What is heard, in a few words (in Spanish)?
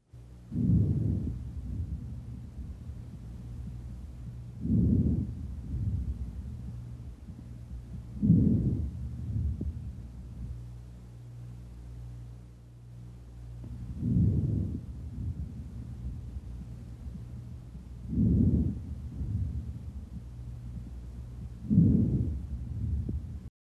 Ruido normal vesicular lóbs inf dcho izq